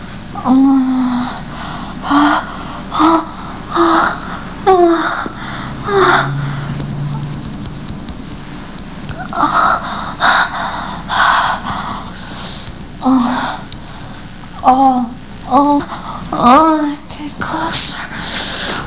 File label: orgasm